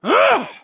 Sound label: Pick Axe Power Attack